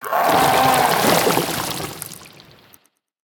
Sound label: barf; En butcher